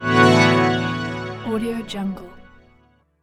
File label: rich harmonics; audiojungle